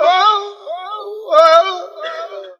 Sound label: "oh oh" male SUNG; ooh ahh